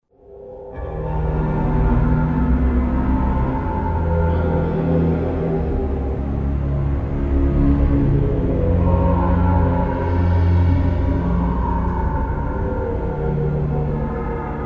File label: stinger song, music stingers; hl2